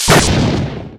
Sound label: Railgun